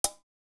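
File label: HH, hi-hat; CONSTRUCT 4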